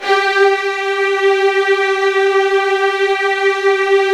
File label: Strings G2 R; Virtuoso